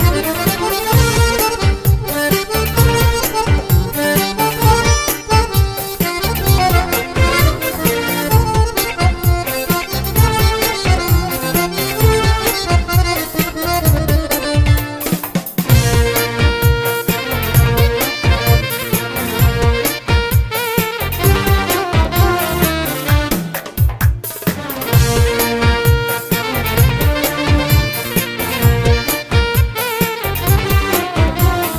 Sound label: Accordion